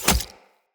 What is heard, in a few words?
Throwing Knives Impact Flesh; Weapon Impacts; Combat